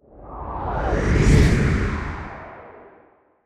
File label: space comet